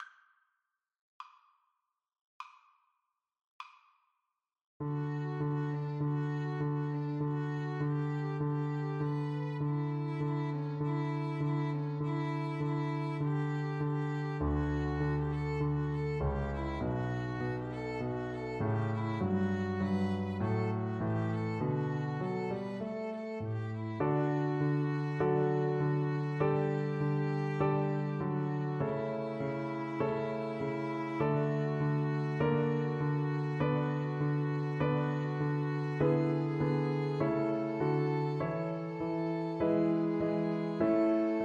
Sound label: Violin Duet